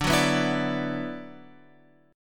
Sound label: D 6th Add 9th D, Guitar, Open F; D6add9 strummed; x,9,6,6,7,9